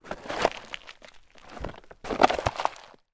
foley dried palm tree branch step on crunch; zapsplat

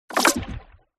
game designed water hit thump

